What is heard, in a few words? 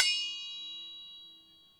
dingy, Bells; Misc Percussion